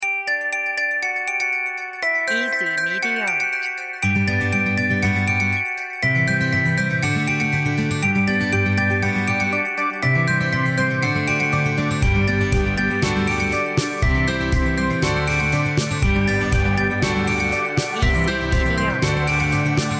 happy children

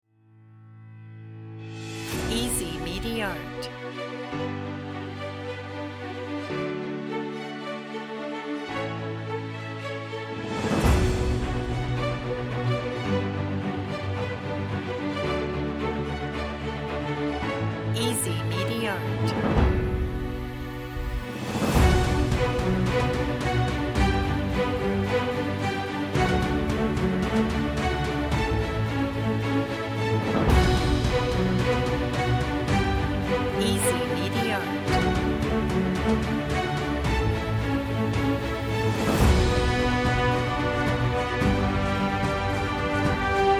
adventure epic trailer

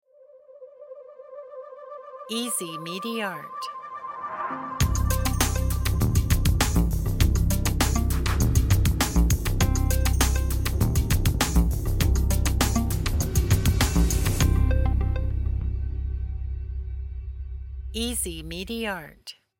suspense logo

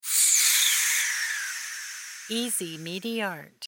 fantasy whoosh

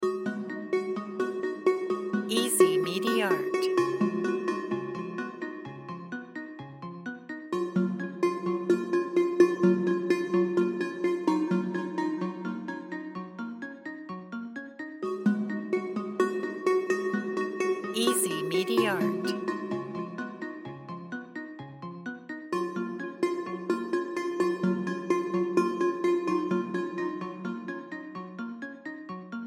love and jazz loop

